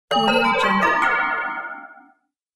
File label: bell gong notification